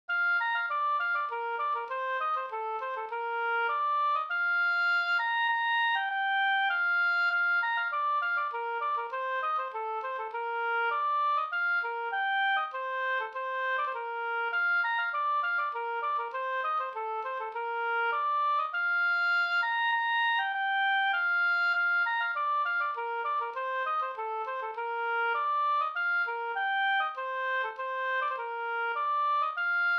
polska Gammal Bjurtjarnspolska

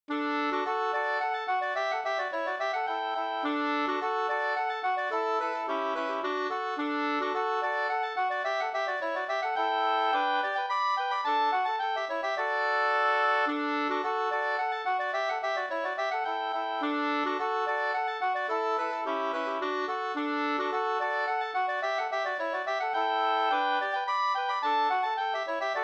polska Bingsjopolska; Norrbommens polska e Pekkos Olle 2st

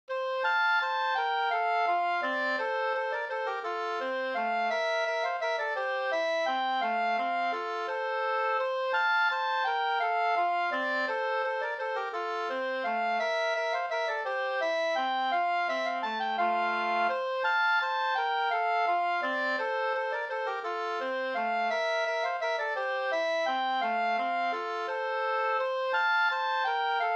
vals, nyckelharpan

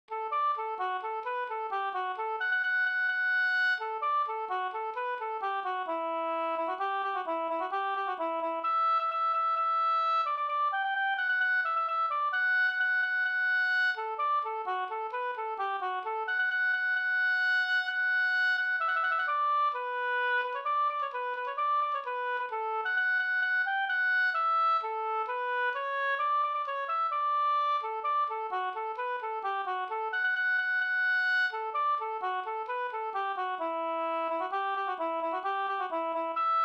hamborger

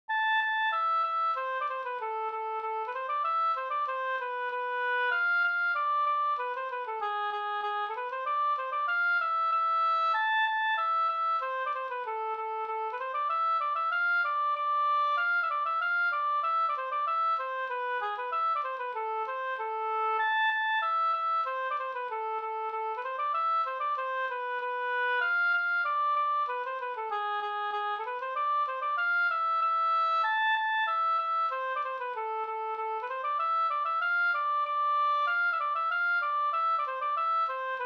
jenkka; Harren jenkka fi

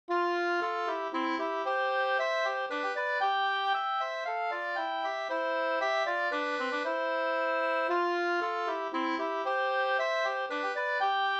polska; Roslagen

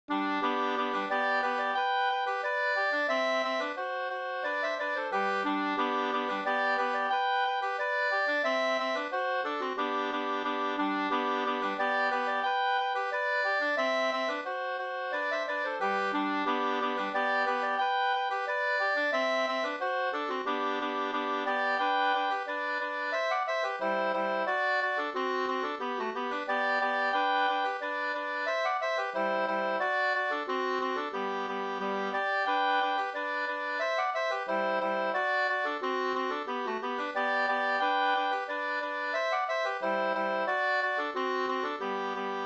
engelska; Hagerbo

